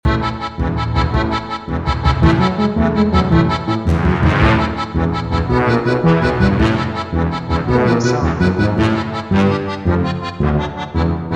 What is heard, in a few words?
Happy Hectic and Fun Loop